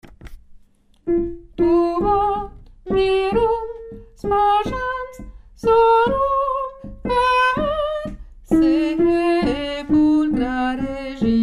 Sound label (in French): mesure 93 basse, chorale